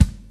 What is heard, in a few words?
short kick drum g sharp key, Royality free kick drum single hit tuned to the G# note